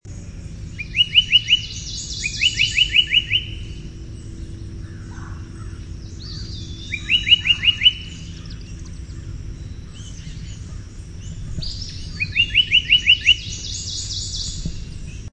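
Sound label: song, Tufted Titmouse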